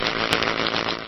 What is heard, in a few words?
Plasma Bolt; Hexvex